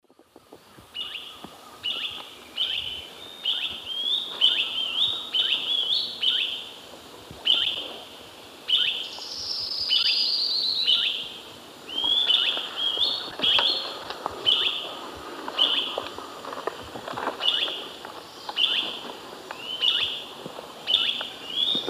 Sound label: Conopias parvus, call; S. America; Eastern Lowlands